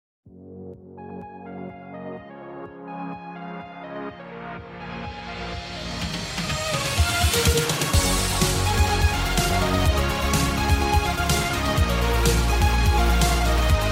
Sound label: Inspiring Uplifting Summer Pop